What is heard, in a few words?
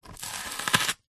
spring onion single chop with knife